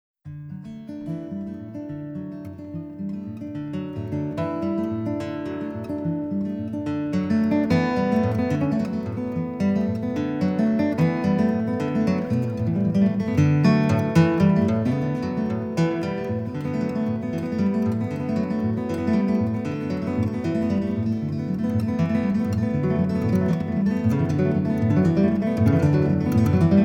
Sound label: Bourrée voltige